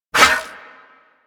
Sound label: Disarm Dart Shot; Warframe; Warframes; Pacifist